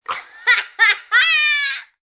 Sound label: Laugh, child, boy, short outburst (human voice); Laughter